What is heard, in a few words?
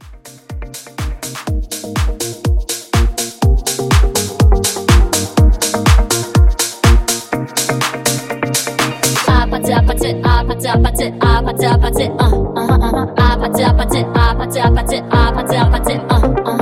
Mashup 123